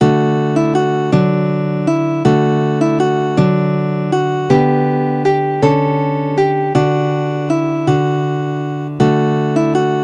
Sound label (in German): pastorale gitarre